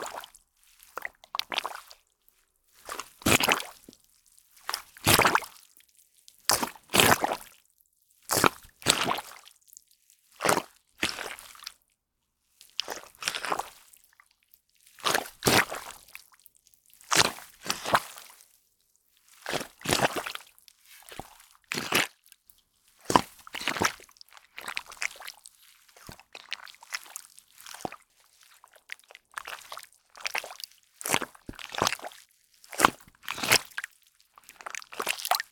Gurgling And Squelching Sounds A Puddle In The Forest